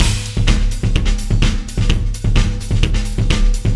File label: Acoustic Drumloop